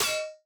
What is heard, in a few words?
Snare Sample, hyperpop beat